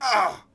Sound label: Hit Vox; Civ4 warlords